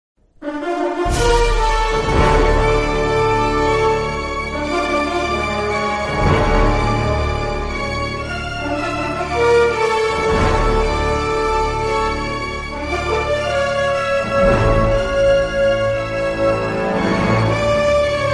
best english movie theme instrumental tone ringtone; english ringtones